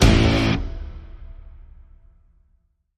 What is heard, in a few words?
Stab Guitar Single (A)